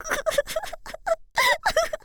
girl kusukusu

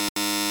incorrect

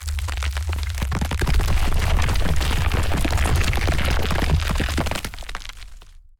building collapse

